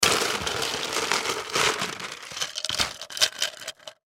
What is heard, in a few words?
Ice Container, Ice Container Pull Out Ice; Food; Household; Blastwave